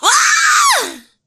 woman screaming

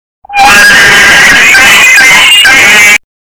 lmao

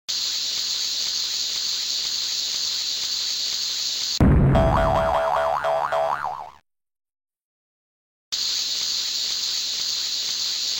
kaboom

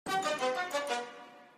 pigeon jingle; goose goose duck

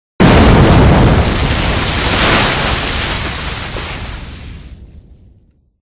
splash agua

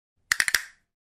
castanholas castanets

